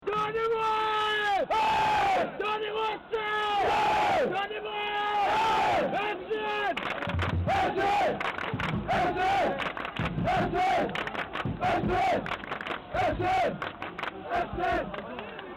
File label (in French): Donnez moi un F, Chants; Nantes